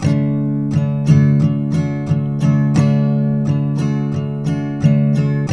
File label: TK MJ D#3, Guitars; Spectrasonics; Hans Zimmer Guitars VOL-1